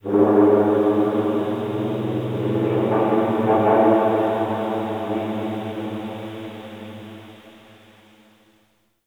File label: Zariman Factory Texture, Ambience; Warframe; The New War